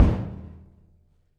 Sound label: Metal